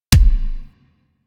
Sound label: Pistol Punch Delayed, weapons; Warframe; Thanotech